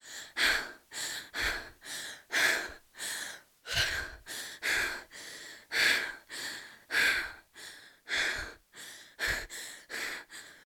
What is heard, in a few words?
Intense Breathing